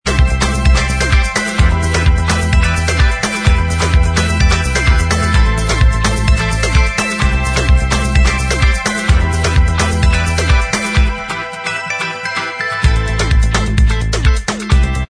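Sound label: Inst Track, instrumental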